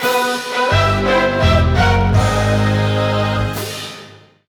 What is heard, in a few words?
Goal Fanfare; crash bandicoot series sound box; Pyramid Pass; 3rd Place